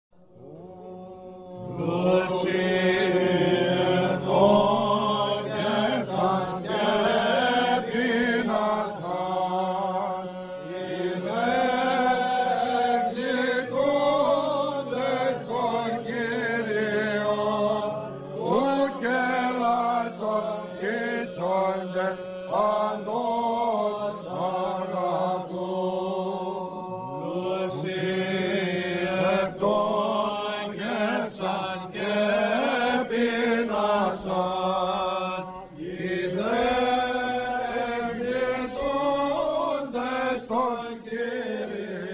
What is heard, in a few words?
vespers; vroukounta